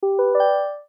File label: correct answer gentle; video editing